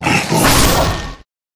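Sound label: Tiger attack up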